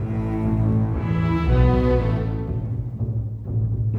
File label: Strings; Sonic Foundry (Sony Creative Software); Orchestral VOL-2; Modern 11